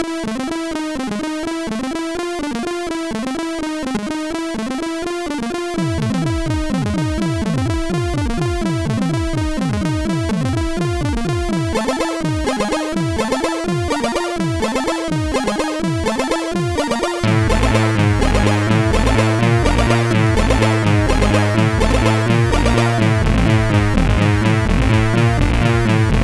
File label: Platform Game, sid CSG8580R5; hvsc